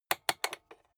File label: rotary control short turn hard